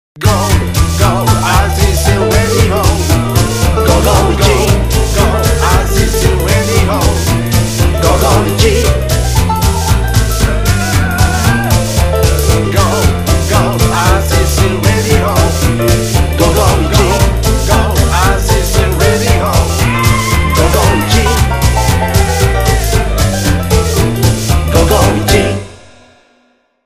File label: jingle long